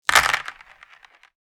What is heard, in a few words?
Board game map token throw; leisure